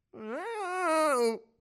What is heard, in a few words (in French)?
chaise plastique tiré; Mobilier